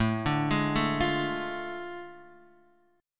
A Major 7th Suspended 4th Sharp 5th, Guitar, DADGAD